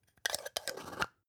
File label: spice jar scratch close clatter; industrie wirtschaft; gastronomie